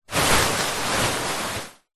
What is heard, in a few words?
household beanbag movement